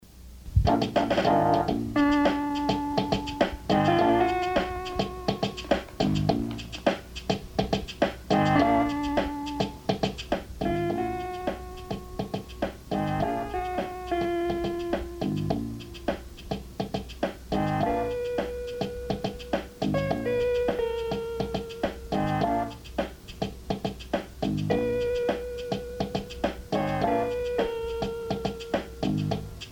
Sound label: moon child instr